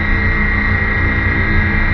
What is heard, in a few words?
pipe, air exchange; hl2